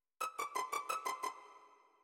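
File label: SMS Pizzicato Strings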